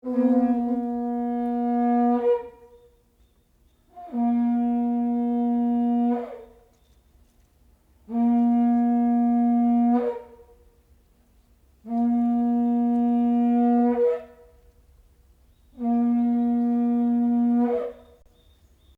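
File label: japan trumpet shell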